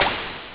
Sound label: SPLASH